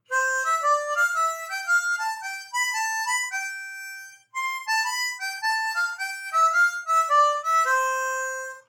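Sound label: C Major Couplets Jig, harmonica; The animals went in two by two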